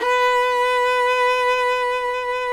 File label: FIDDLE NOTES, B4, L